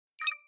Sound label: computer processing short click